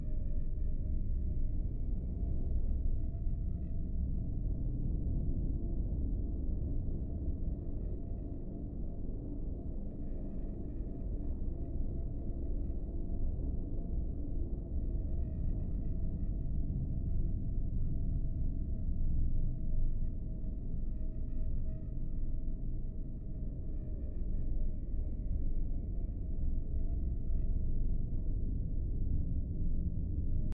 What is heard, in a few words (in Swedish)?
Bakgrundsljud